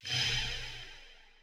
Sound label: Whoosh Woow